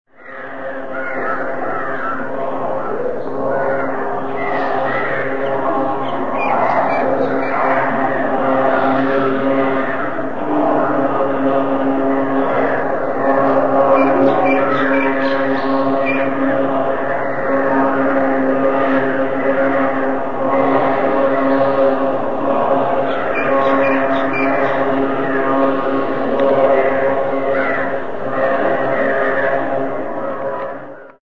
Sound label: birds and monks; india; Prayer; monastery; 5:30-6:30 Prayer in meditation hall (puja)